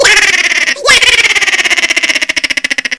Funny Laugh

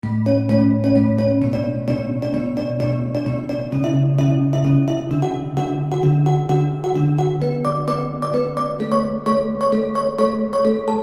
Xylophone

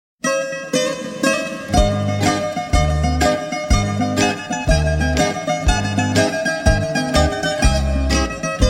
Greek Ringtone

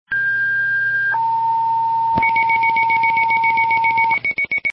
Minitor 6

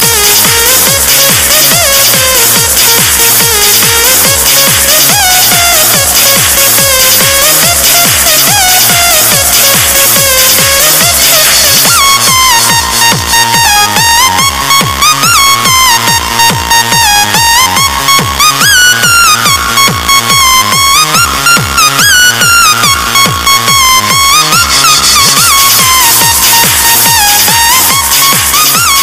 Hight Sound

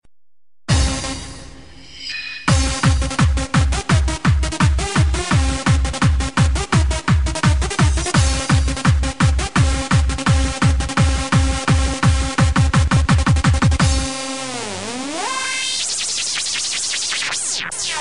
Hard trance shuffle

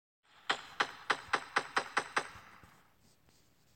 scar sound; Fortnite

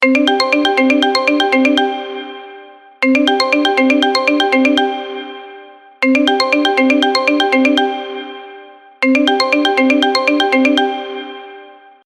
Marimba Ringtone

